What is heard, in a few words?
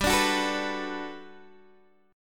G Major 9th, G, down, Guitar, DADGAD